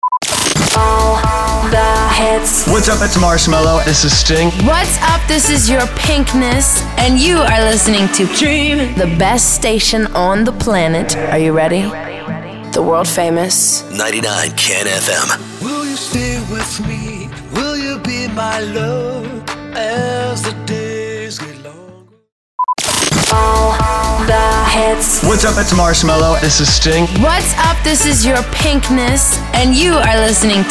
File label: POWER INTRO 125BPM; SWEEPER